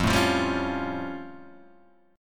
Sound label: A Augmented 7th F